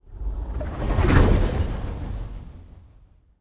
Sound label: construction ship move; aquatics